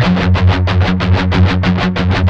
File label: 16TH, Guitars